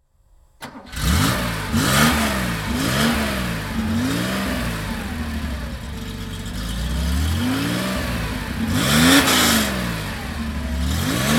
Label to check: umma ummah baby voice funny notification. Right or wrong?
Wrong